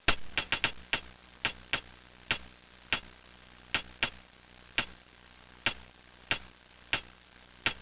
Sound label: Tambourine; Soundsation; Music